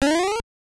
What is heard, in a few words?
Opponent Jumping Away; Mike Tyson's Punch-Out!!